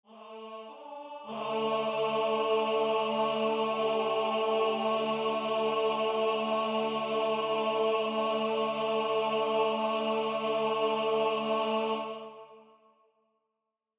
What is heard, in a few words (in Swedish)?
TENOR